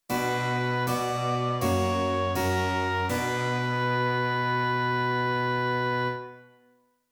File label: continuo, dictation